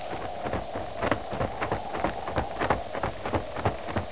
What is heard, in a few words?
wings